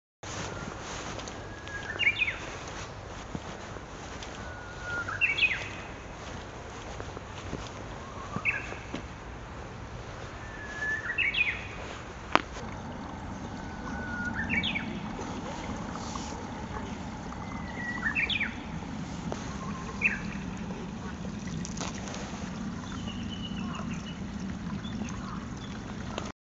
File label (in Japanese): hokekyo